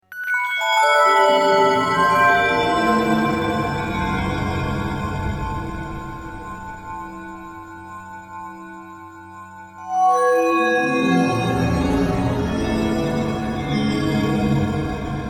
Bell Threshold; Worcester

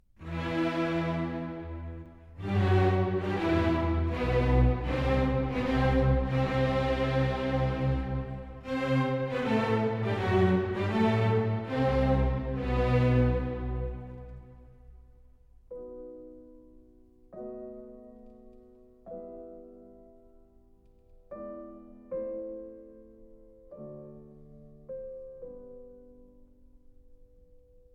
Piano Concerto No. 4 in G Major; Op. 58; Beethoven

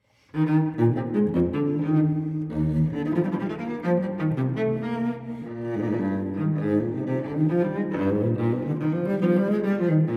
Cello Suite No. 4 in E flat major Courante; BWV1010; Johann Sebastian Bach

